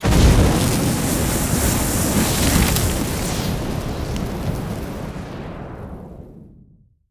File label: Streaming Waves